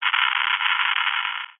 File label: science fiction radio receiver transmission interference burst static crackle